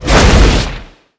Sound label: flame strike; MUDevs S20.1-3